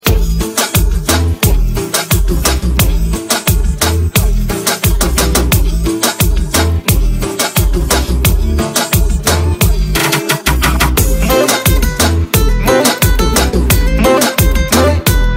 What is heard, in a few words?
instrumental